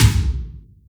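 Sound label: tom, Boss DR660, lt; drum machine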